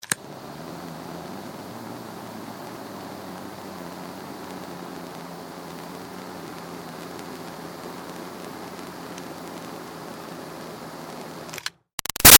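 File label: Lighter Butane Torch Small Close Up On Steady Off; Flames; Sound Effects:Objects; Big Room Sound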